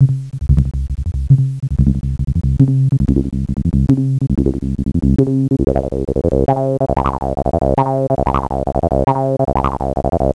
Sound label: freq low